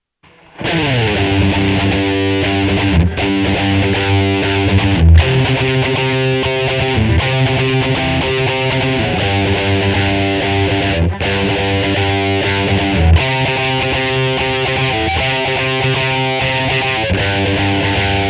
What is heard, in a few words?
Guitar Deep Fried